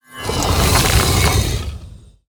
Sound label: Attack